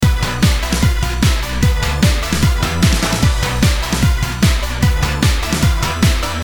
Techno Loop

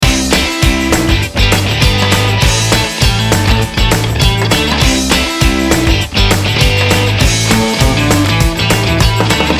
Indie Garage Loop

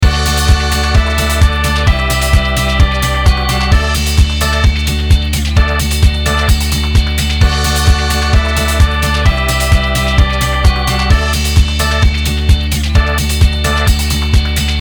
Indietronica Loop

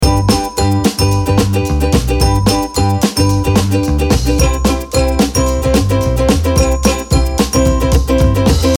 Cheerful Loop

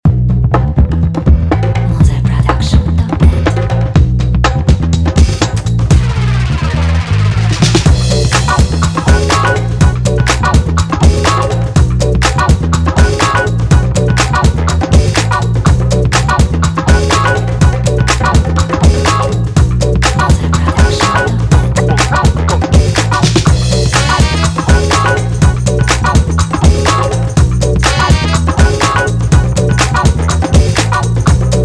Catchy Funky Beat

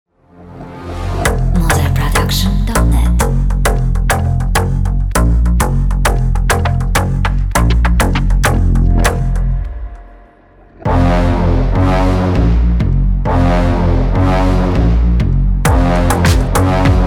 Hard Bass

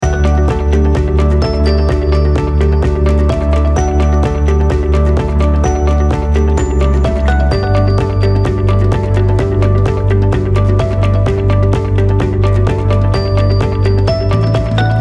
Inspiring Loop

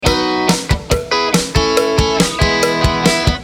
Funny Neighbors Loop

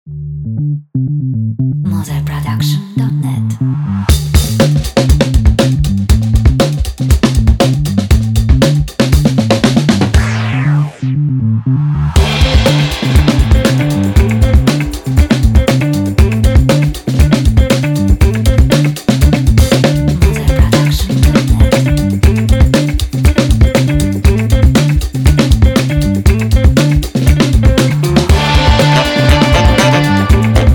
Catchy Uplifting Funky Groove

